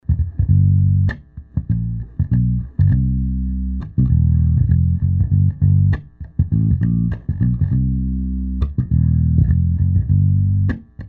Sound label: Bass Gemini 5 FET, amp